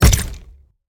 Pulse Wrench Hit Ground Other

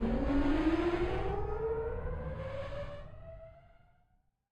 Player Conveyer Start

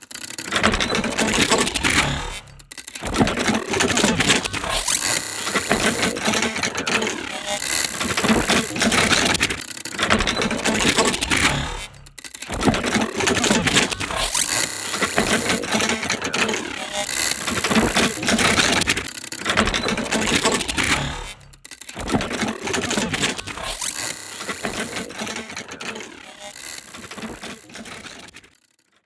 Glitchy

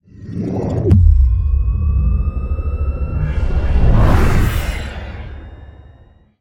Center Ring Ball Descending Locking In

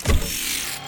Hacked Status; Heartball

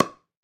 PickelballPaddle Hit Ball Medium

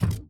Player Grab

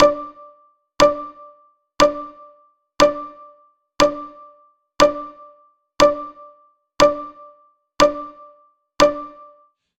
countdown timer buzzer; countdown idea; Sports

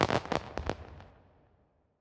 Fireworks

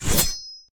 Pull, Blade Pull-Release

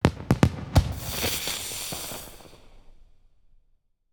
Celebration Fireworks

